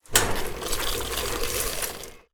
Farming Tool Engine Contraption Garden Machine Device Craft; Sunflower Land